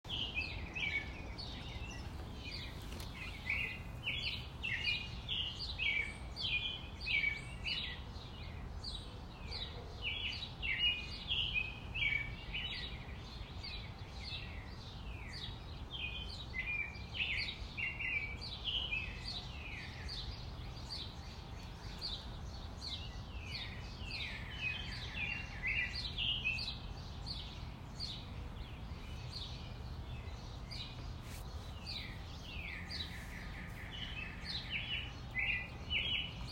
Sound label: birds in backyard